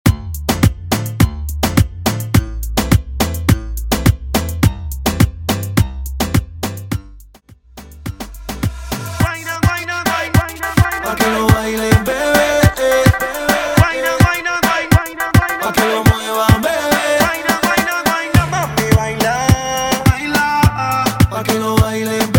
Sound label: Dancehall Intro Break Outro 105BPM